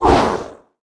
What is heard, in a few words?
attack, monster; recycle monster